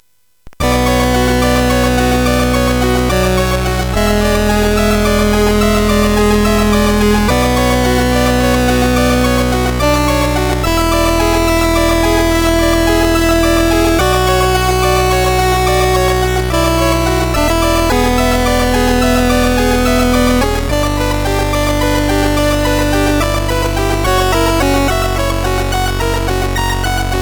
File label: Space Gun